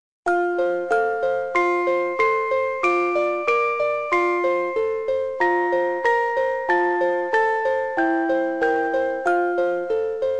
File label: Twinkle